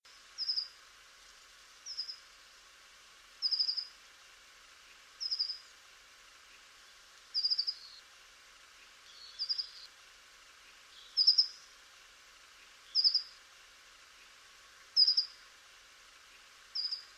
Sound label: Dunnock